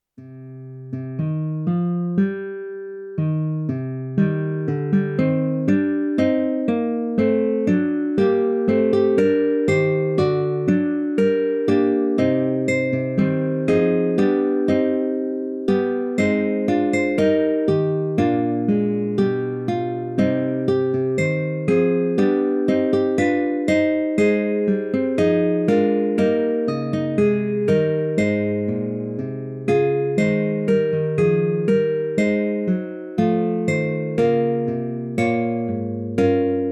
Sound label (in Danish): Se nu stiger solen, Orgelkoraler; Orgelkoral for manual med pedal over Oluf Rings melodi fra omkring 1915; Orgelkoraler til gudstjenestebrug